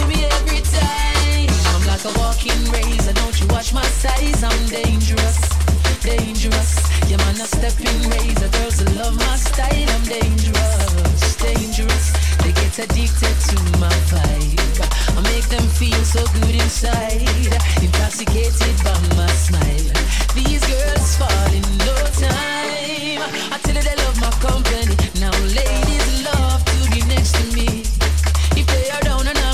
gun step

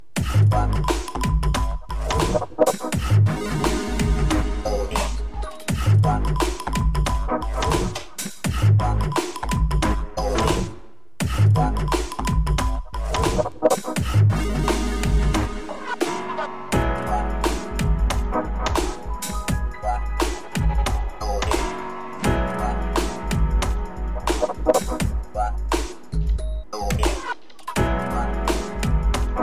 piano

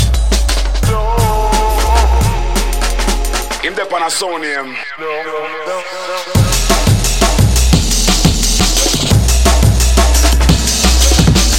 drum

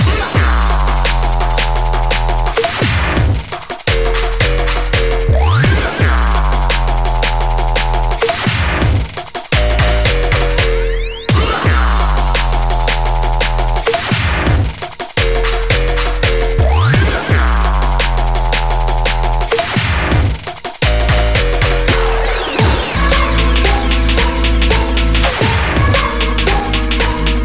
rock pulse

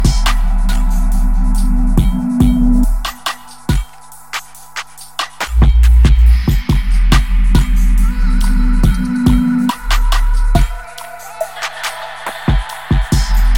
drone

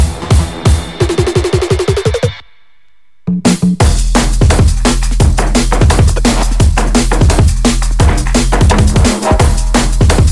taiko

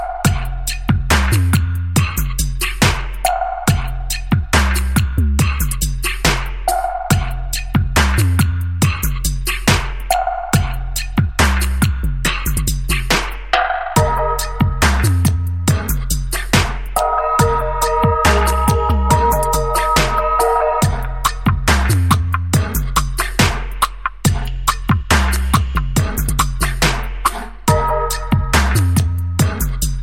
piezo happy

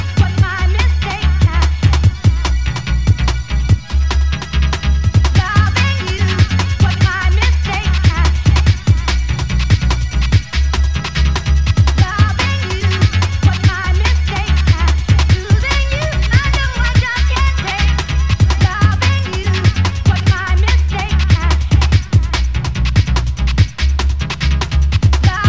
foul Dubbing

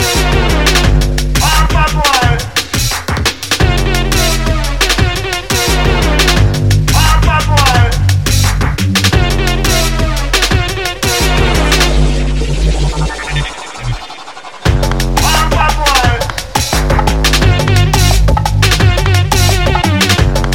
horns

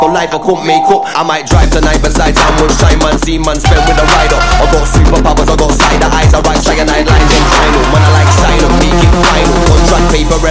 taiko stop

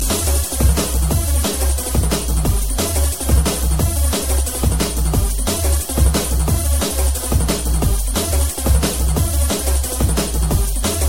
drum Graveyard

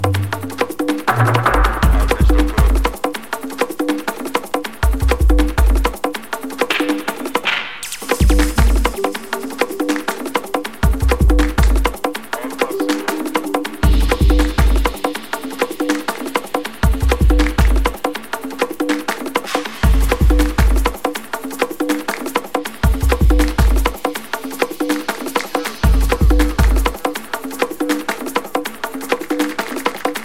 cry groove

